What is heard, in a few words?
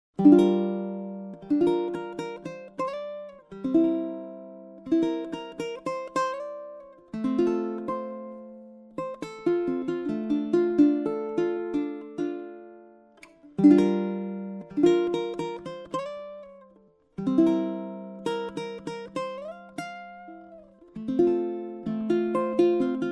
uke